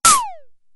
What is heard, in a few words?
DF-500 Misc, Drumfire